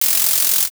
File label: shuffle